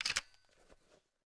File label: m3s90 load; garrysmod; m3s90p